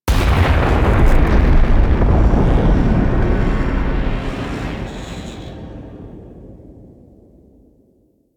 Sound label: Transition Ship Rumble C; Missions